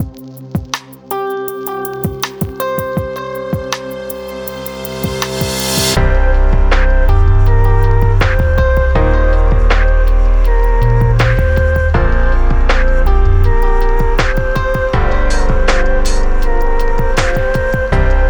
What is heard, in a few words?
House